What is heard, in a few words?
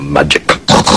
magic snort